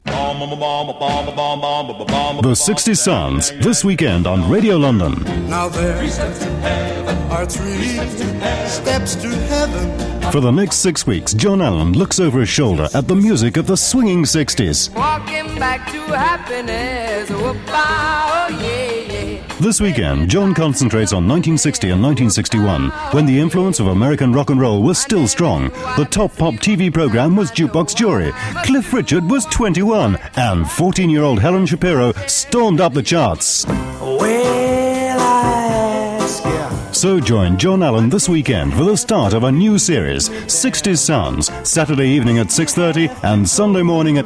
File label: sixties sounds